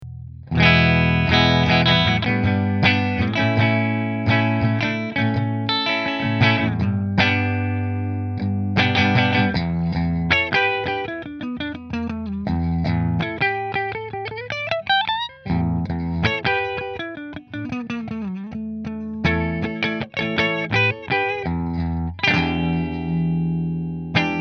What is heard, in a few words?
Orville Middle Fender, guitars